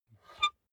puncher squeak release

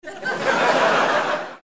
laugh

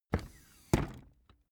office chair hydraulics up

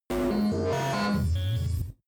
space computing machinery hard busy

